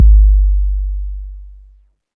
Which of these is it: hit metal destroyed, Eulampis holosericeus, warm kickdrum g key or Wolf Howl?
warm kickdrum g key